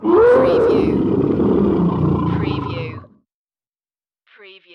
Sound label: Tiger Roar